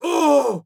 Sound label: flinch hard; Rust